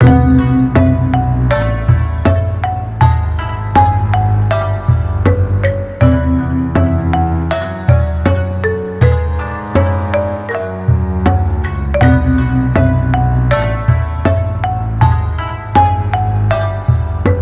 field mountains

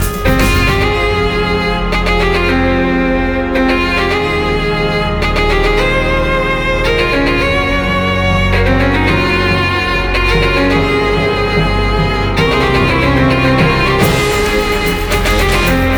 drive music; Pacificwar

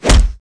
Attack

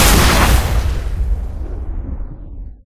bomb

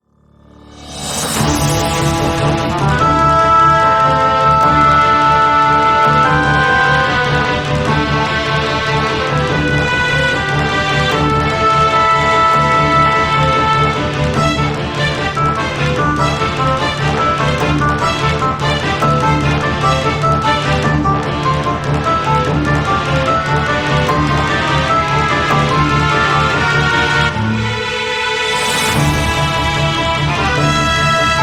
Bgm Fantasy

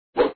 miss

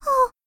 Voice Breathing

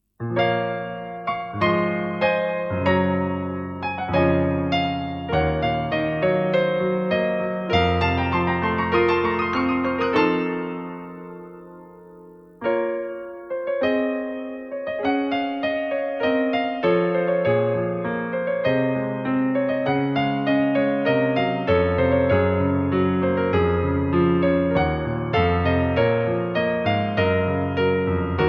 bgm

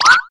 jump

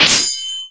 sword

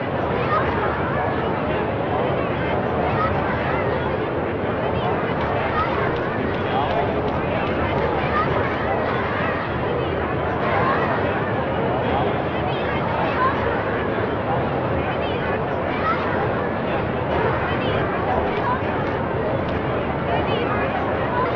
people noice

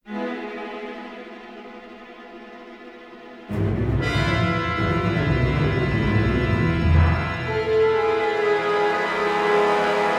bgm

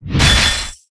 Ice

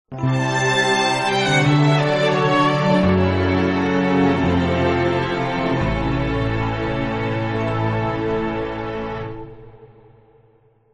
rest

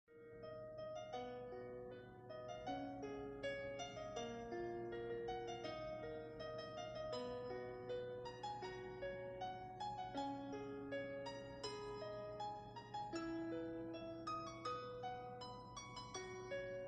bgm

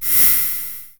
rewind exempt start

